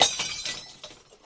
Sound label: amethyst break; Minetest; VoxeLibre